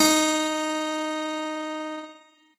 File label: piano